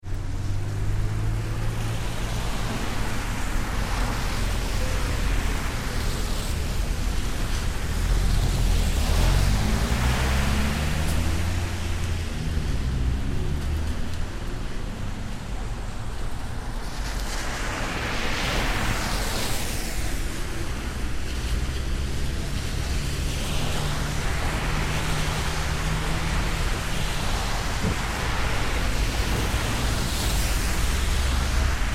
Wet Traffic Intersection Long: City traffic at intersection; during rain. Very wet surface, raindrops in foregroun; Traffic And Car Sound Effects